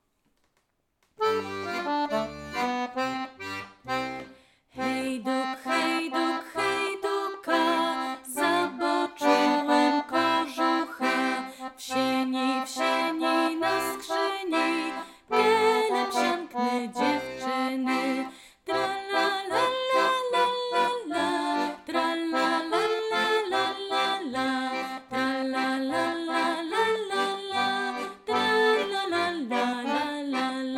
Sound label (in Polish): spiew; gwara